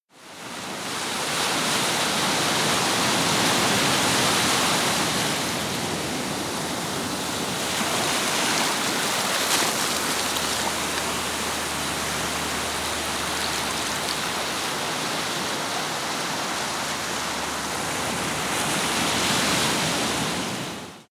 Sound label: SoCal Beach